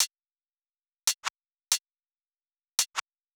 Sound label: drum Loop 140BPM HH